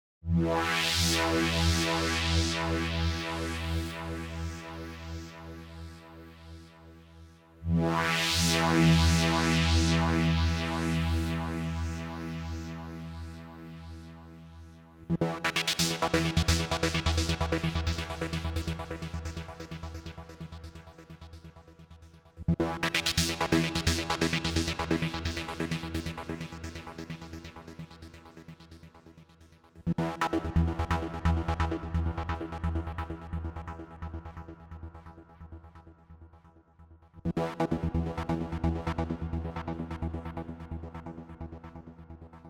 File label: synth fx